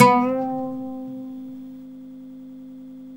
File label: OUD GL SUP C